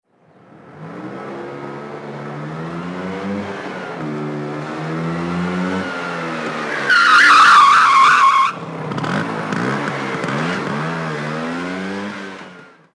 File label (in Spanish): Choque de coches frenazos